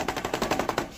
brush cleaning

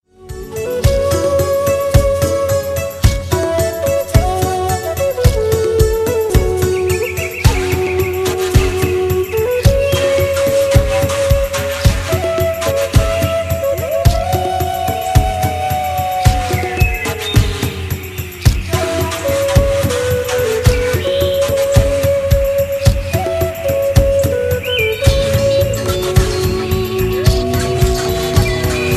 smooth ringtone; ringtones

